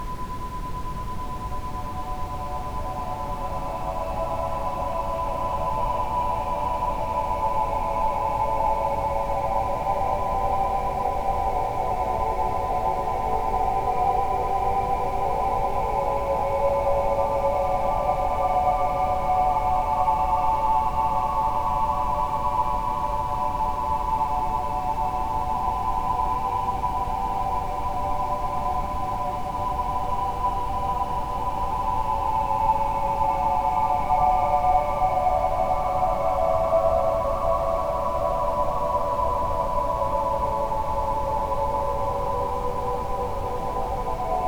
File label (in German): mit Ambient Sound; chakren; Bindu Visarga